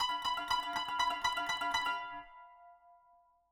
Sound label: kanun